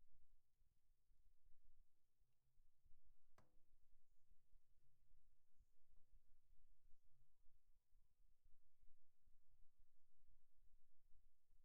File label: Snare Bottom, 160 BPM